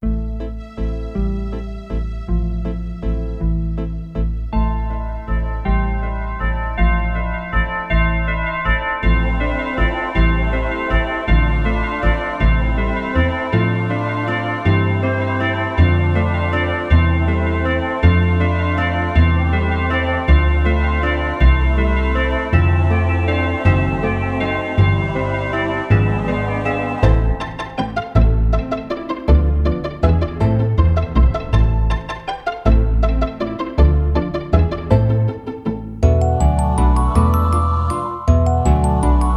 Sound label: Christmas Bells